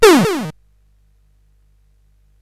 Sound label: Paddle Mania, CSG8580R5